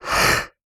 Animal Mammal Carnivore River Otter Snarl; animals; AdobeCC

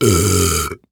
Human Male Burp Long

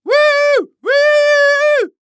Human Male Cheering Woo Hoo

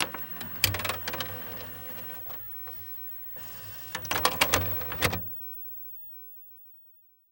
Technology Electronic VHS Tape Eject Tape

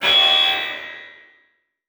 Transition Hit Impact Electronic; Production Element

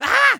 Cartoon Human Male Scream Surprise Scream

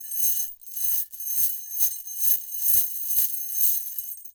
Foley Toy Slinky Movements

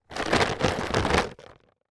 Clunky Rattle Short; Foley

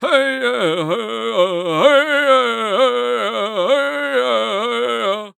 Human Indian Chant Voice

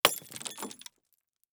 Crash Wine Bottle Smash; Crashes

